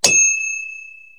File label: Ding